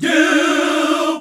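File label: Gospel; Best Service Gigapack 1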